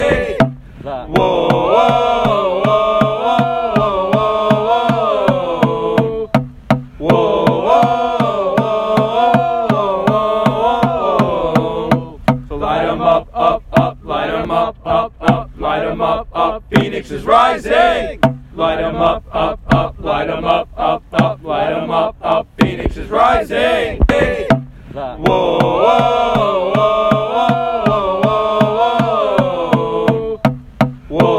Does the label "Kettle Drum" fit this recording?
No